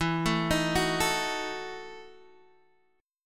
E Major 7th Suspended 2nd Suspended 4th E down Guitar Standard; arpeggiated; JGuitar